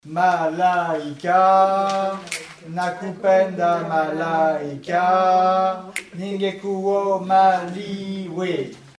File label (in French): Sop alto texte seul